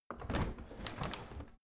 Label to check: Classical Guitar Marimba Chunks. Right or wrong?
Wrong